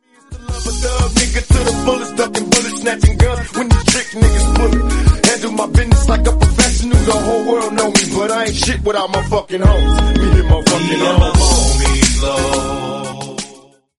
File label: english rap song ringtone; english ringtones